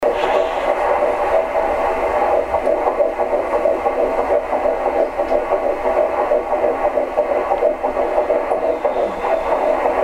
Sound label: just heartbeat